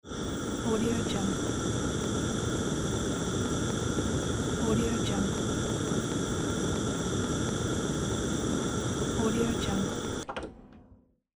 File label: gas stove flame cooking